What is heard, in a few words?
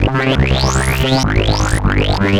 Roland C Line, Roland S100; musicradar-synth-samples